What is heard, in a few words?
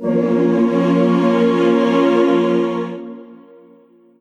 chord